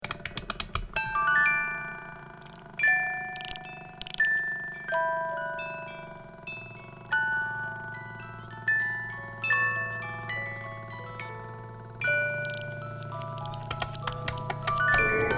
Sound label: music box opera